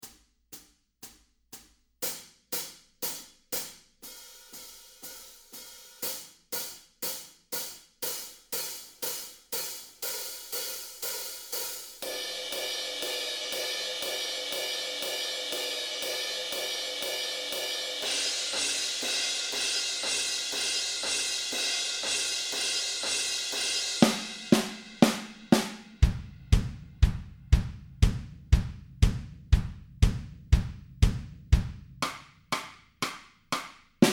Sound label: pop rock